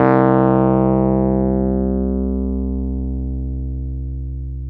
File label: PNO Wurly